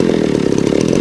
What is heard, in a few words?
chainsaw idle